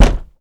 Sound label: door knock; dota; dungeon